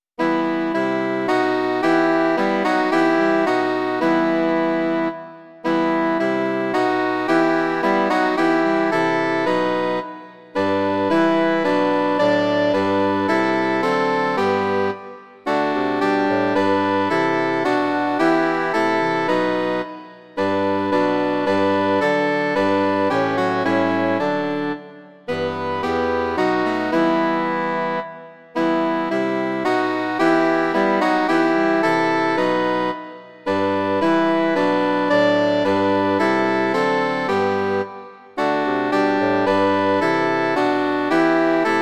trio saxofonů a fagot; Chvála Bohu na výsosti; Imaginativní verze

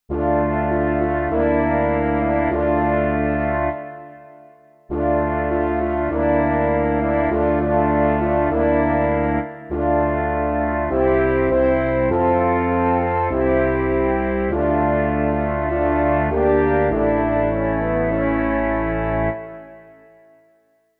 trio lesních rohů a tuba; Imaginativní verze

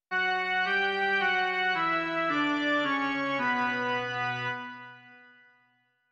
jen melodie; Za tvé slovo, Bože, dík; Doprovody Farského liturgie

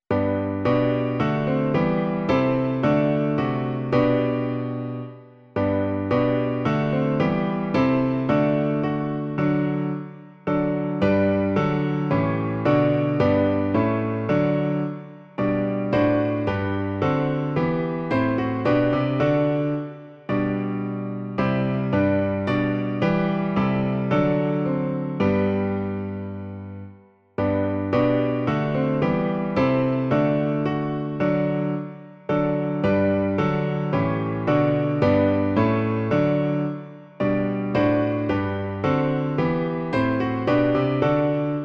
Sláva Bohu budiž v nebi - klavír; Klavírní verze

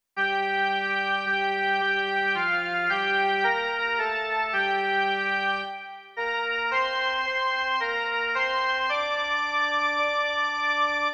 jen melodie; Přej, Bože, pokoje nám všem

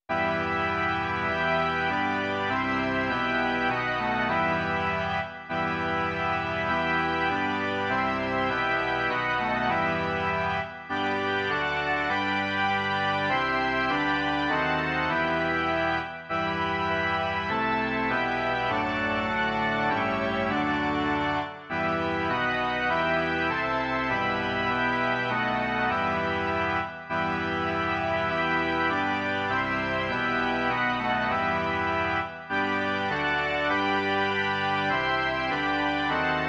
varhany

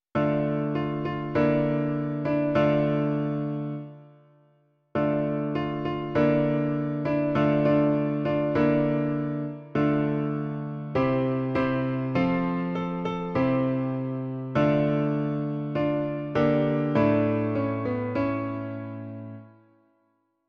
klavír, Klavírní verze

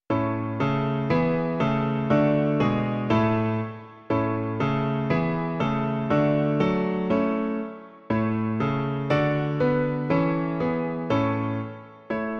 Ovečkou že Páně jsem - klavír; Klavírní verze

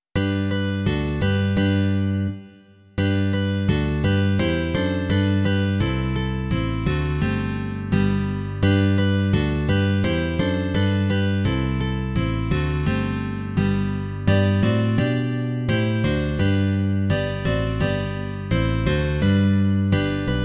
tři kytary a baskytara; Čas radosti, veselosti; Imaginativní verze

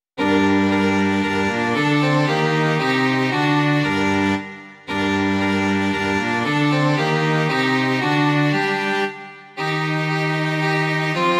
smyčcové kvarteto; Pojďte, bratří, pojďte spolu; Imaginativní verze

